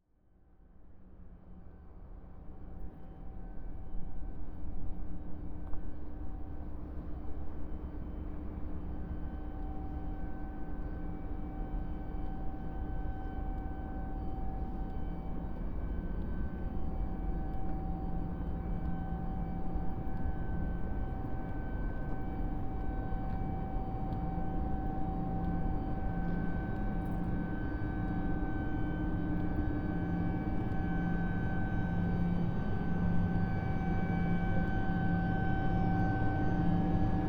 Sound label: karlin noise